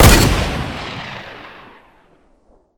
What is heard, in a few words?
hunting rifle; Original TK Sounds